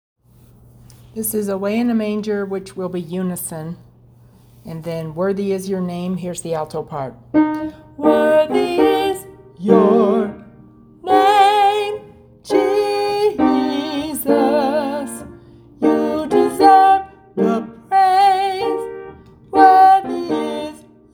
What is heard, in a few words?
Away in A Manger alto